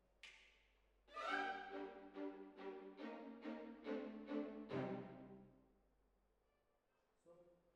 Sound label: Hall, Jazz for piano